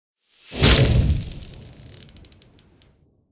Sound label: Ice spell designed impact